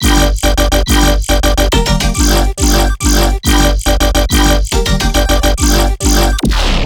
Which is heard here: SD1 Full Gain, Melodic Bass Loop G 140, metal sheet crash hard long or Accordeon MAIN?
Melodic Bass Loop G 140